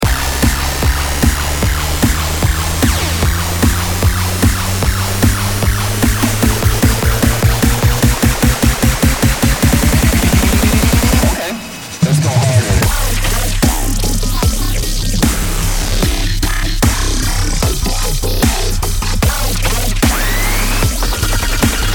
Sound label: dubstep; weeklybeats-2020